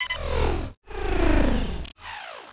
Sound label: baron power Down, droids robots